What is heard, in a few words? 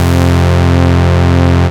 Synth Cuts; Vengeance Samples; VES1 Instruments